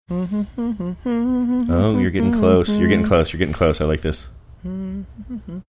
humming song while trying to think saying oh youre getting close